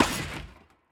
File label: sting rank star loss; Armello The Bandit Clan